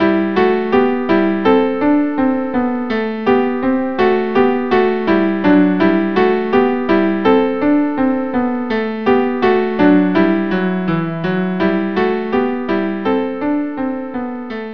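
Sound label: viola cello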